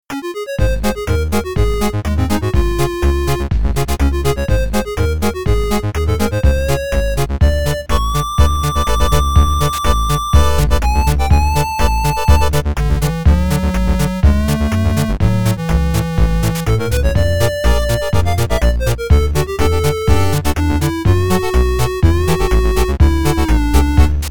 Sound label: Chiptune